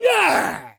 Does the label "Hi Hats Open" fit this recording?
No